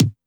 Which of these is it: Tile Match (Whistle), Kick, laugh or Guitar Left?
Kick